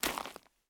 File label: zombie footstep mud; DeadFright